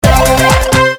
bonus chance; All Ways Fruits

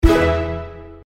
scatter 3; Horoscope

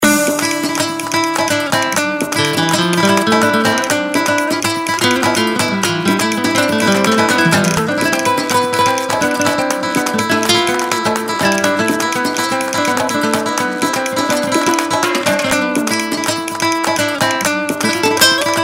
intro bgm; Bull Stampede

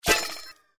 hit; Robots

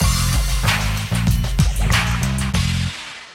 start; Grand Casanova

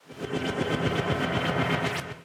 long spin; Great Book Of Magic Deluxe; Wazdan

